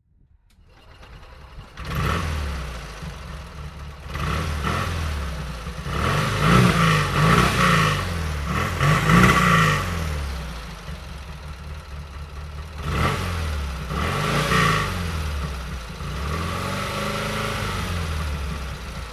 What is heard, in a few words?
Steyr-Puch 650